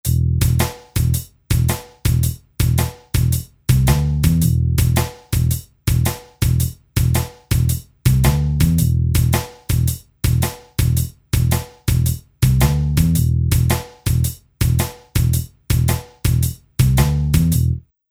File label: Blues